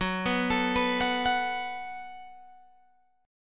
F sharp Minor Double Flat 5th F sharp, Guitar Open G